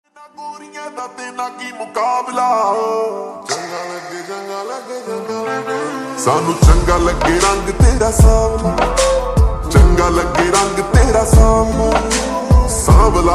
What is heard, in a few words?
Slowed Reverb Ringtone